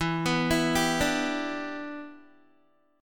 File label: E Minor 7th E down Guitar; arpeggiated; 4E,4A,5D,5G,5C,6F; Em7